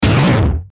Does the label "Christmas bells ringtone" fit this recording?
No